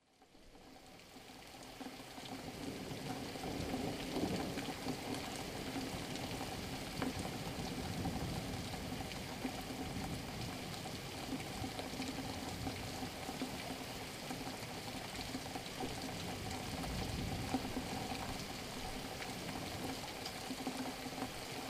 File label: Kent Thunderstorm; location series selection volume 01